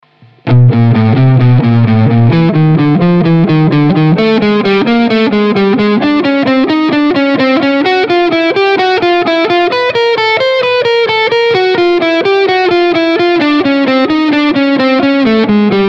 Esercizio 1 Corde adiacenti

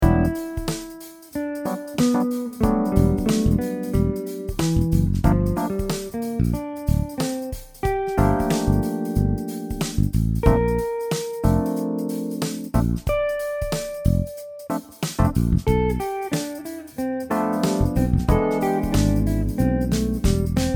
con chitarra

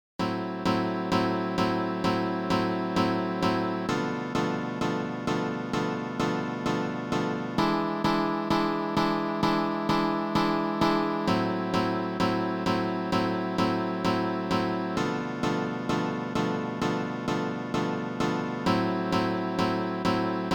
accompagnamento

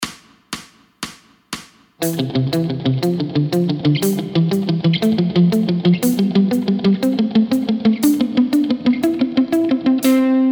Intervalli di Terza

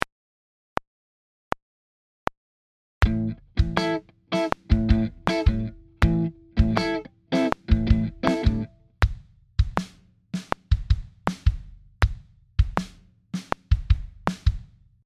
cassa e rullante groove

